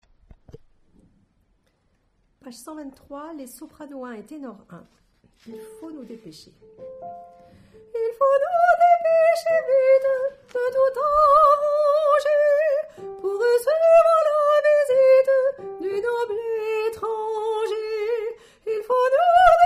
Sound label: Soprano1 Tenor1; La vie parisienne - p123